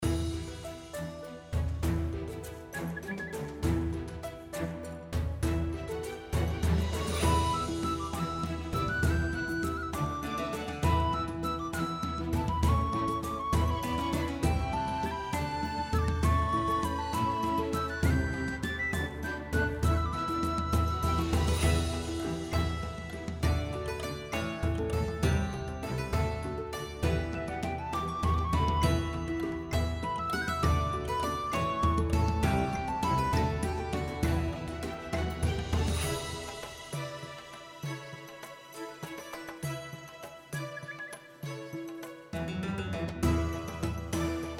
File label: Battle Ancient Native